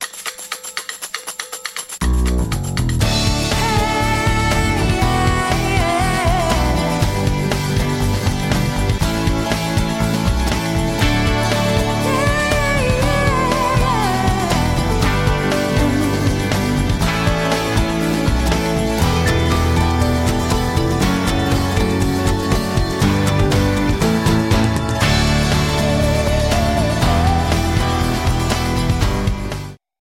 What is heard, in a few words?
Pop Walk